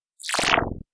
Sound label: Flutter gun